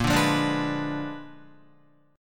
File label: A sharp 7th Flat 9th, Guitar, A#7b9 chord; strummed; JGuitar